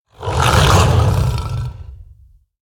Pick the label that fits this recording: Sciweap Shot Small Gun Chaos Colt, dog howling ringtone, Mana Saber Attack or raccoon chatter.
Mana Saber Attack